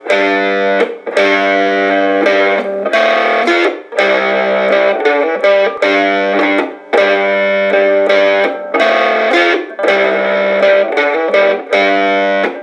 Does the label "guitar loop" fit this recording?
Yes